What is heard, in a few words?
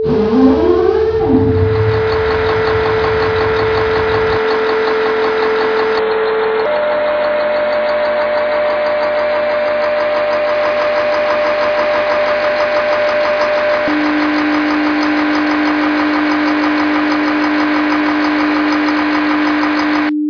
Cummins 8cyl